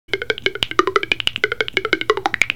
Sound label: Clack deep, jew's harp